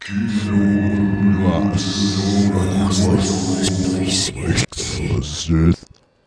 mutter

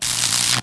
damage; apocalips

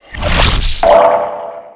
aqua elemental die

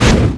STAR LIGHT KICK hit; sky emperor

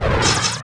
sonic claw, effect; spirit handler

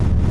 spark move

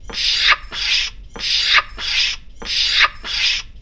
scratch

